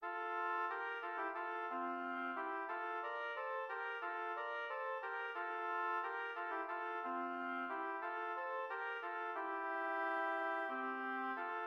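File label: air in F major 2 oboes; handel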